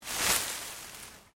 Leaves Move Medium; Material components for constructing more complex sounds; Rustling; Objects

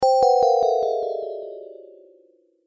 Tap Down Delay, tapping; object sound effects

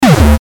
Hit Digital, Hit Digital Distorted Bass; Sound Effects:Sound Design; Blastwave

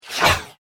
Brief In Your Face Flyby Whoosh; swish, sweep and swoop

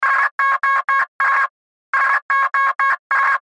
Radio Morse Code; Weak Signal Emergency Sos Twice; Military; Warfare; Blastwave

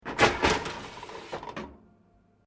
GRILLER GAS TRAY OPEN, Portable gas cooker; Paloma; Appliances (Sound Effects:Household)

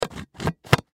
Cd Case Lid Close; Cd Jewel Case Lid Close Plastic Movement; Foley; Plastic Sound Effects; Materials; Blastwave

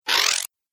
Extending Arms Of The Robot; Robot Sound Effects

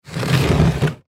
Toolbox Slide, Toolbox Slide Plastic; Toolbox Slide Plastic Empty; Hand Tool; Industry; Tools

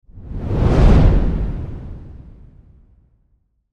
Fireball Burst, Fire Burst Flame Blast; Heavy; Flame Sound Effects

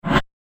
Short Fractal Whoosh; Whoosh Sound Effects

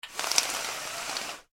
household window blind venetian wooden pull up; Venetian Blind ( Wooden ) Being Pulled Up / Opened; Windows; Blind (Venetian) Opening; McKinneySound

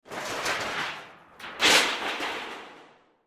Metal Sheet Pick Up Toss Drop; Foley; Materials; Blastwave

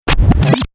Turntable Scratching Reverse; Dj; DJ (Sound Effects:Musical)

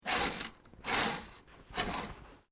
curtains hallway small open, Household Curtains; Living Room

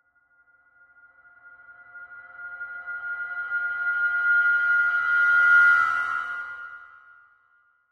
Horror Cymbal Swell Dissonant High; Scary Tone; Blastwave

